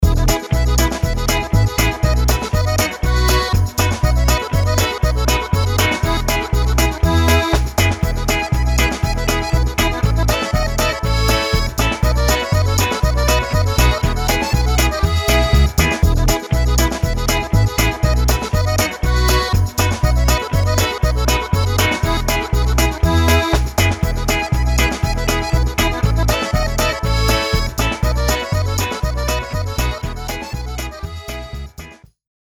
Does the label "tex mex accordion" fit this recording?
Yes